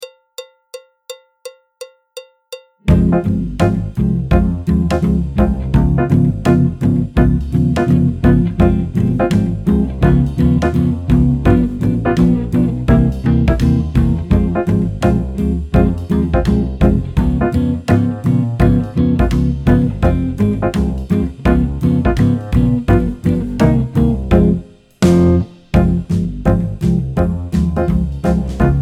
tenor, trumpet; Jazz Syllabus Level 4

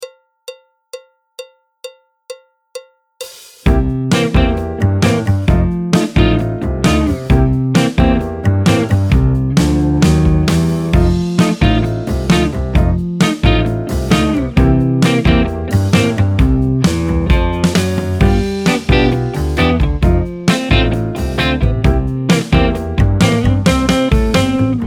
Blues, alto, clarinet, flute; Jazz Syllabus Level 4

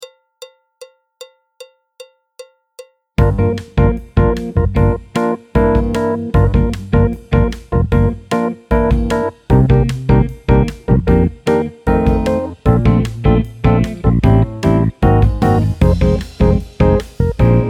clarinet, flute, trombone; Jazz Syllabus Level 4

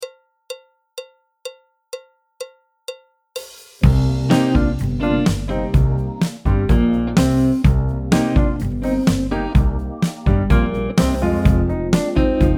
Setting the Pace BT (clarinet, flute, tenor); Jazz Syllabus Level 4

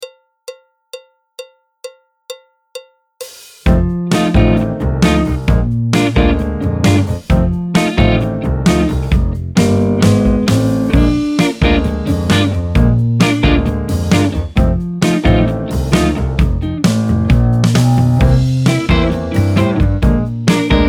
Sand Blues BT, piano; Jazz Syllabus Level 4

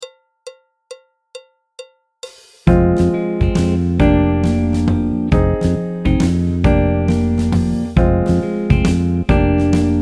BT (alto, trombone, piano); Jazz Syllabus Level 4

